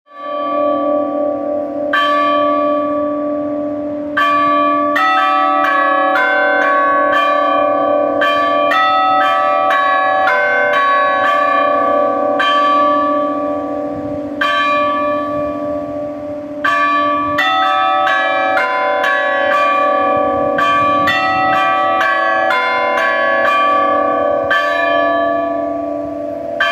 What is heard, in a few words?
echmiadzin bell